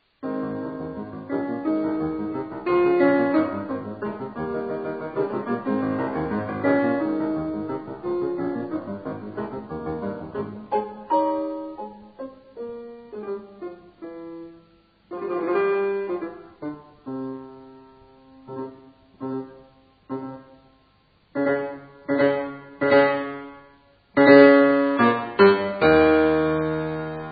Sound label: piano; Piano Sonata number 18